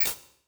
Transition Hit Impact Ping; Adobe; Production Element

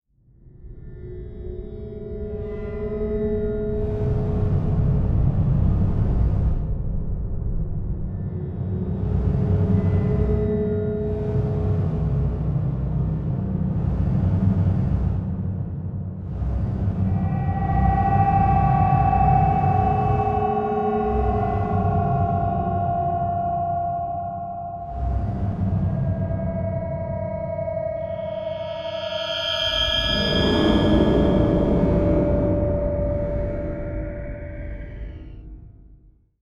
Low Pulse; Adobe; Production Element; Imaging

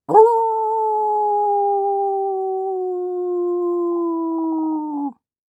Horror Scary Werewolf Howl

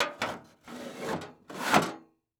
Sports Grill Metal Grease Trap Sliding In

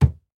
Human Arm Place On Desk

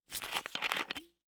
Bottle Plastic Unscrew Open; Foley

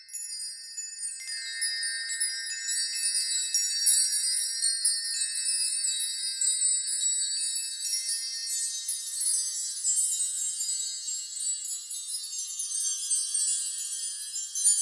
Light Chimes, Christmas Sound Effects; PremiumBeat

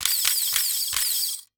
Foley Toy Magnum 45 Gun Firing

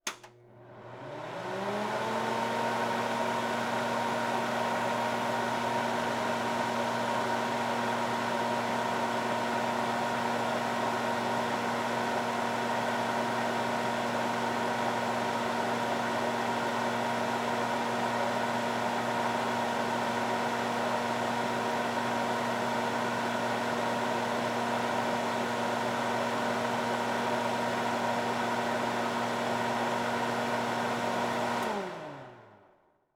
Household Oven Run Hood Fan On High

